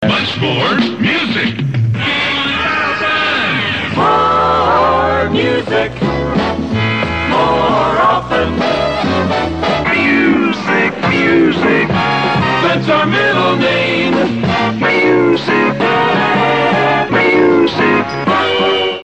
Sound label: Jingles; Much More Music J1000 Music